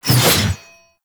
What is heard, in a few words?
Heavy Scythe Charge Whoosh; Warframe; Duviri Heavy Scythe